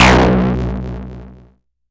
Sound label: bass stabs, Drum Machines FutureRetro FR777